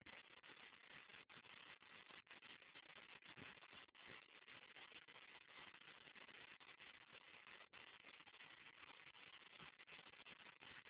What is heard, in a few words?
porcupine; zoo 1993